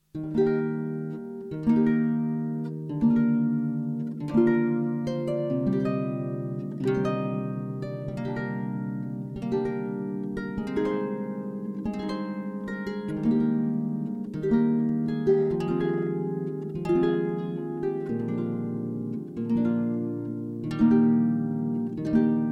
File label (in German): Arpeggio